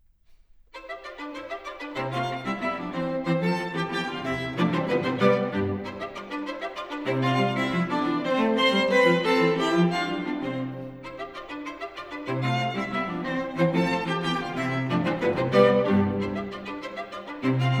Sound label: String Quartet no.4 in C major K.157 III. Presto; Jerusalem Quartet